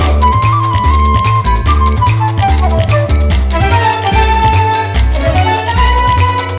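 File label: food world music paradise suspens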